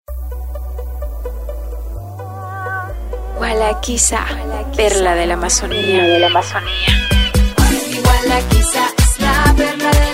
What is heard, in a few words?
jingle; gualaquiza